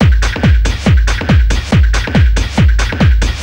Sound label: Beat, Drums; TechnoMusicMaker